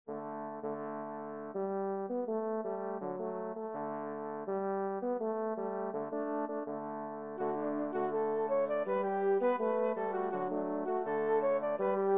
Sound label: Chor; Meo Limao meo limoeiro